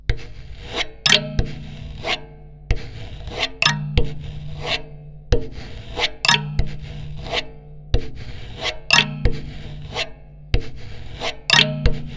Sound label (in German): Soundbox Mk2 Sandpapier Kalimba